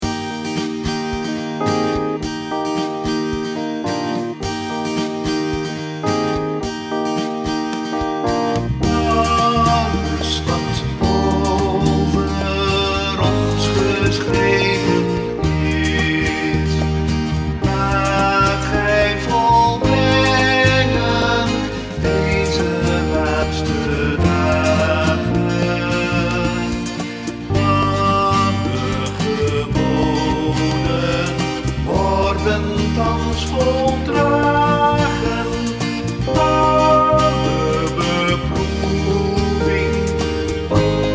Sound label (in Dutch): Gezang 173 zang